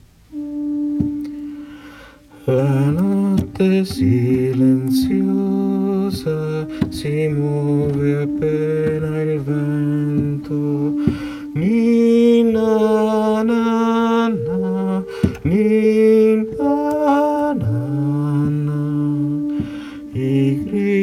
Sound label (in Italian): Pastori Bassi